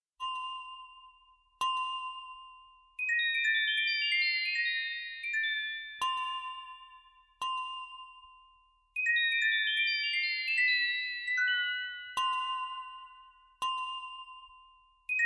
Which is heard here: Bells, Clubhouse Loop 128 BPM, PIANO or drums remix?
Bells